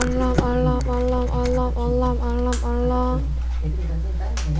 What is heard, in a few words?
alarm